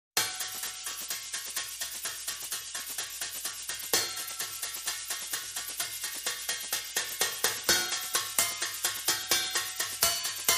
High Drums

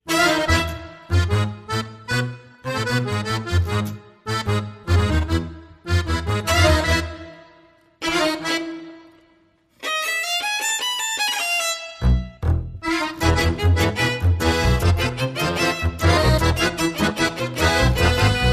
No Perc

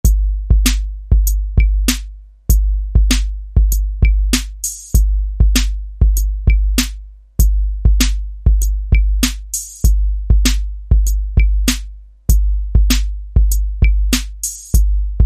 Hardcore, Drum Loop

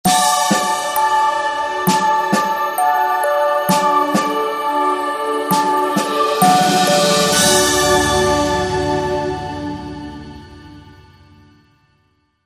Drummer Boy Short

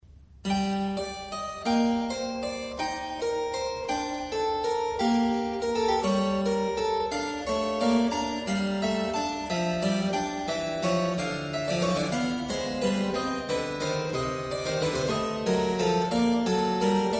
kleine Praludien in A minor; BWV942; Johann Sebastian Bach

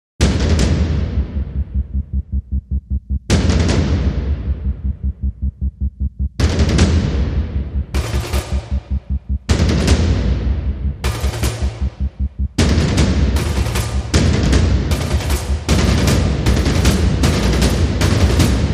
Drums and Bass

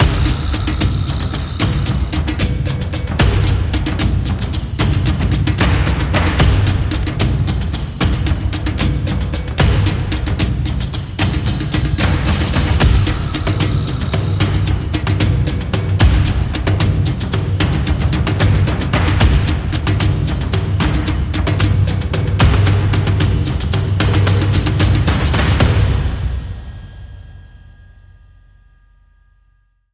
Percussion

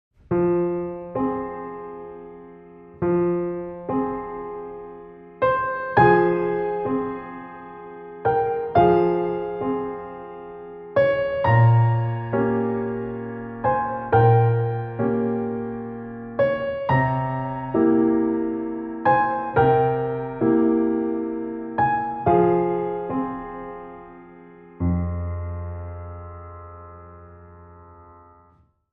Sad Piano

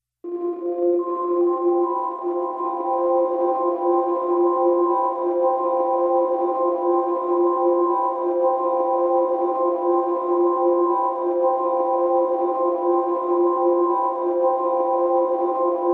Synth Drone Only Mix

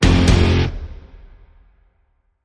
Stab Guitar Double (F)

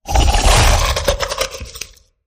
Zombie Sweep

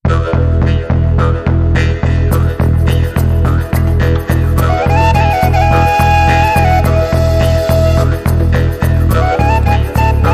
Lowland No Vocal SFX

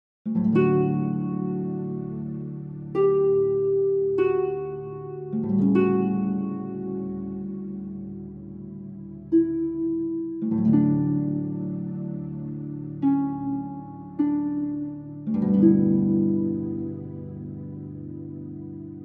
Pads

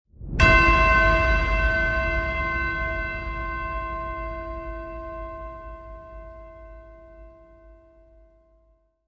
Big Holiday Welcome Ping

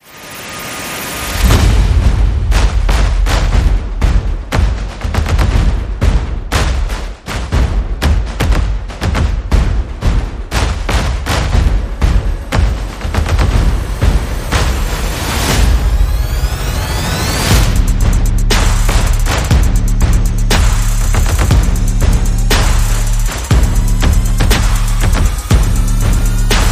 Percussion Bass and Effects

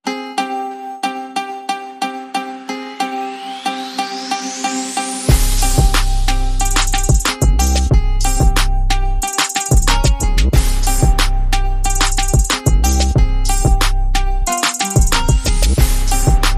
No Vocal

